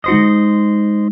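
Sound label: Notes G#7 Up; Hylics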